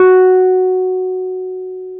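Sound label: piano Wurly F#4; Keyboards of The 60's and 70's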